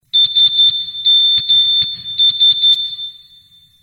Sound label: Morse Sms